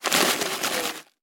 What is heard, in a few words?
Potato Chips Bag Shake